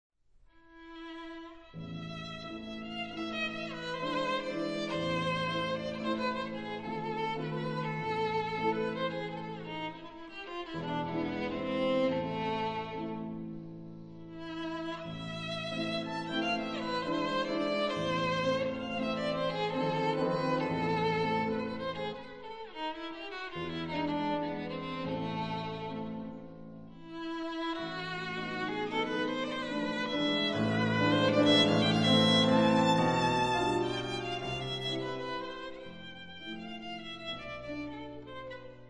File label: Mazurka No. 4 in A Minor, Classical Violin; Frederic Chopin; Mazurkas Op. 67